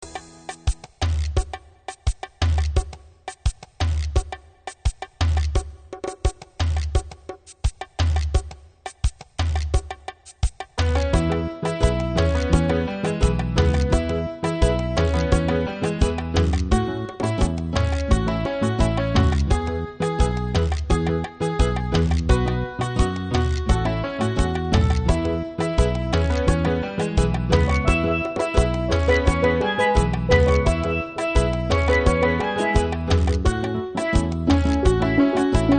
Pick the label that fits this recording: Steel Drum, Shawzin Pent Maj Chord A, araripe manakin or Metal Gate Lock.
Steel Drum